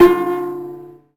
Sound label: Cello Hit String Snap; SFX Kit; Foley - Misc